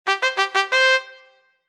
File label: win; TopDogLabs; CasinoGames; Baccarat